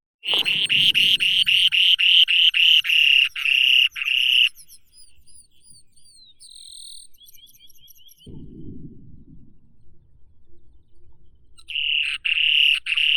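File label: spoon-billed sandpiper, Eurynorhynchus pygmaeus; lopaten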